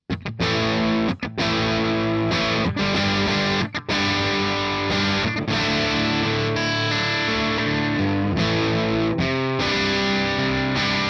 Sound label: Rock; Peerless TMC6